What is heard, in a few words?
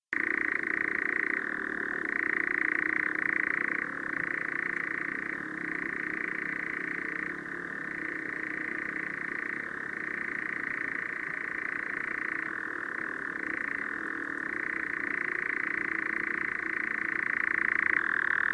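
obyknovennyy kozodoy golos, common nightjar voice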